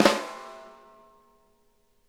flam, snare; Sonic Reality; Interactive Drum Kits

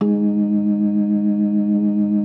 B3 G#3; Best Service; Hyper Dance

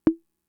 Conga, Fricke MFB-522

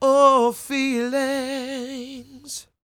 croon, Vocal Planet Blues Soul; Spectrasonics

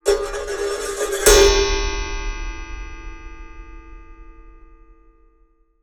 Raw, Wire & Tin, contact mic

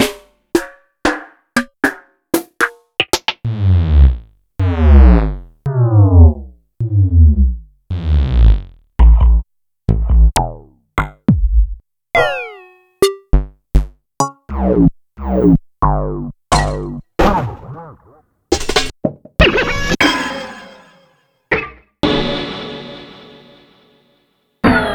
Yamaha DTXpress Effect

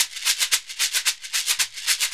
SHEKERE, Synclavier Percussion; ILIO

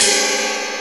LINN ride; Crypton - DrumBase5000; LINN9000

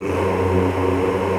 choir, F1; Best Service; Extended Classical Choir

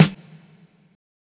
SDA, Disco Fever drum samples; Best Service ProSamples vol.48 - Disco Fever; AKAI

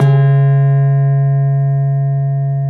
GENDER, Bronze Gamelan; E-MU Formula 4000 Series Vol. 4; Earth Tones

